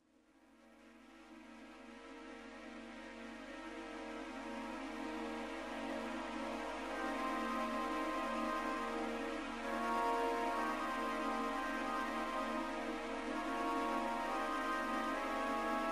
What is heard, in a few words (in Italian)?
tensione